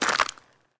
rubble step; Golden Crayon Sounds

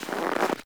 snow step; Golden Crayon Sounds

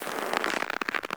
snow step; Golden Crayon Sounds

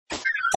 alert close; ESAN VO Sound Schemes; ESAN VO Sounds Pack; Esan isounds add-on

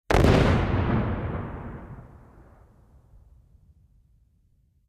missile dist; Original TK Sounds

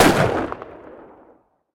glock; Original TK Sounds

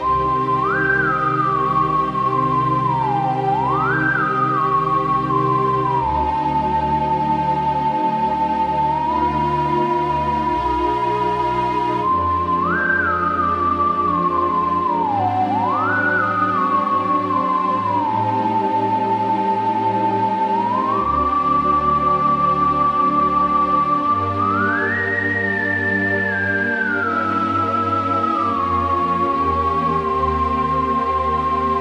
cave; Manamon Music; Manamon 2

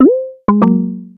Busy Done; Timecrest Music

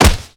wall pad; Golden Crayon Sounds

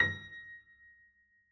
piano a#; Golden Crayon Sounds

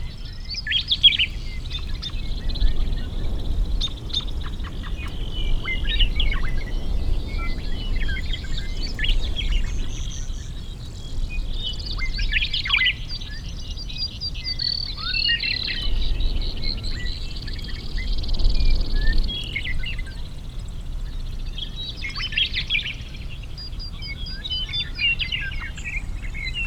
birds; Golden Crayon Sounds

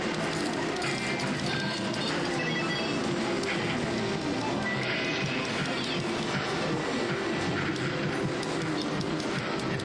arcade; Golden Crayon Sounds

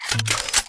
tank artillery before shot; Original TK Sounds

